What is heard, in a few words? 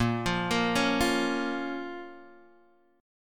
A sharp Minor A sharp down Guitar Open D